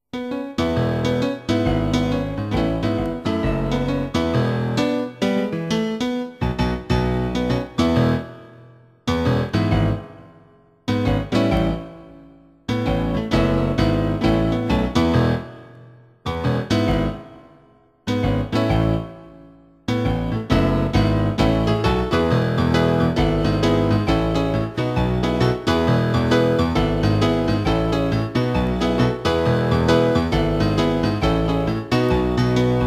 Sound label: rock backing; resurrection